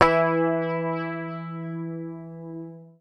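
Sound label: bass, E3, left channel; PICK; Sampleheads; Will Lee Bass Library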